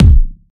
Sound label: kickdrum sound g sharp key